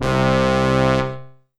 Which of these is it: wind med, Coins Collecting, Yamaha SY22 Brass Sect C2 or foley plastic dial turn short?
Yamaha SY22 Brass Sect C2